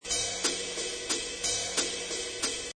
Loop Jazz Cymbal Riff 180, Jazz cymbals riff loop at 180 bpm.; Drum Loops